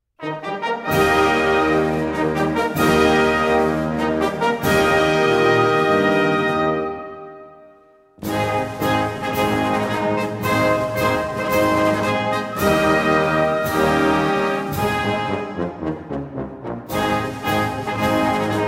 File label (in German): Blasmusik Tusch; Königlich Blasmusik Hannover; Hoch solln sie Leben